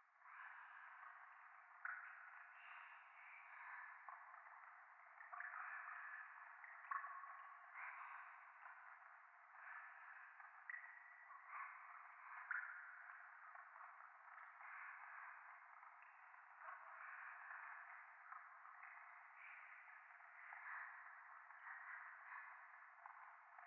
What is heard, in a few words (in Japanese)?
廃墟 左耳密着処女喘ぎ 雨上がり